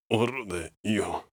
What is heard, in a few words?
in Pain